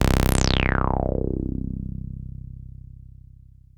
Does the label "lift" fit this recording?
No